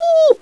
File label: CRY, dogz